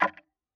Tick, Clocks Kitchen Clock

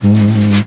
Snare Drum; Percussion Samples

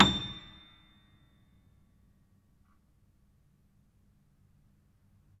Note High, Piano; Piano Miscellaneous

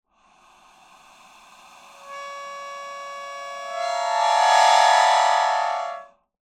Clusters, Melodica

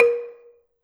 Gambang Hard Beater, Gamelan

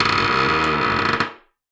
Door Creak

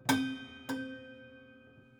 Prepared Piano

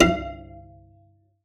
Cello D Bass

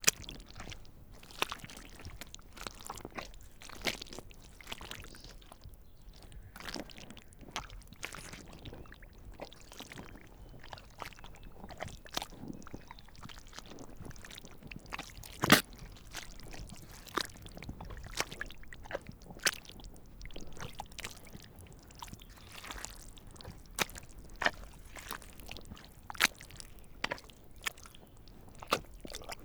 Mud

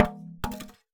Polystyrene Rattle

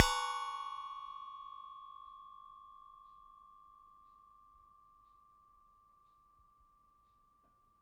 Metal Teapot Stand Strike

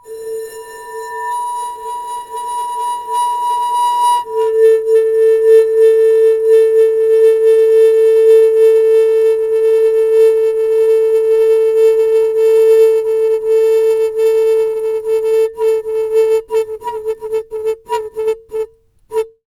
Bowed, Large Wine Glass

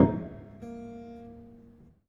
Harm High, Piano Harmonics

